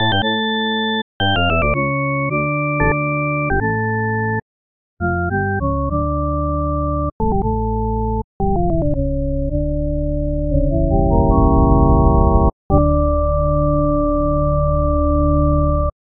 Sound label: Organ Pipe